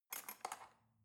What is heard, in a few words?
cutlery knife drawer handle soft